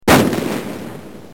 Ric gun

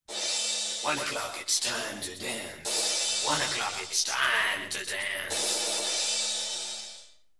Jingle

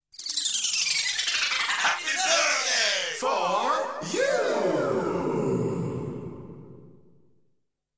Jingle

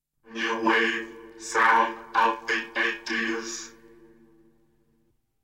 Jingle

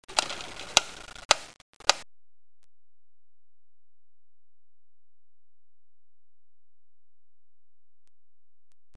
Metronome

